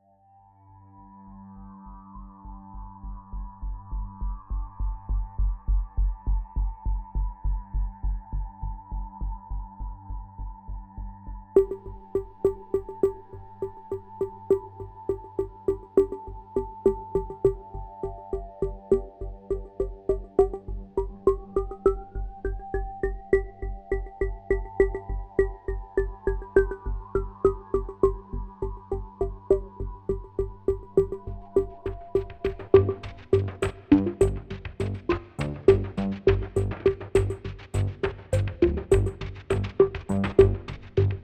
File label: Calculations deep